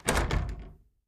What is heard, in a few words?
Attic Closet Door Clo